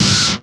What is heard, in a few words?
FIRE SNR, Drums; Distored Reality 1&2